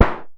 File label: Perc Hit, Noise